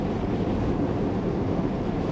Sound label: fire small loop; rise of maya